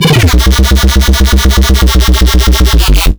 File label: E vocal-y, bass